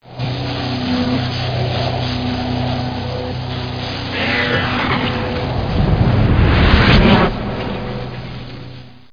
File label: electric; reflect